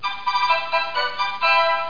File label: CLEARED; typing